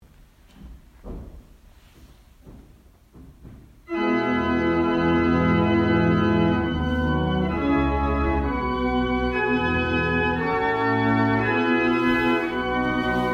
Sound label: Irish, barrel organs; Brightling